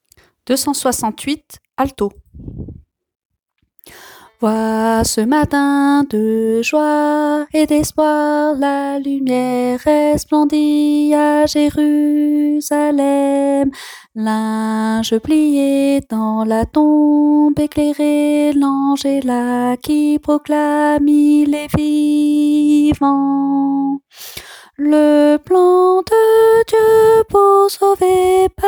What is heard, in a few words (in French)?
alto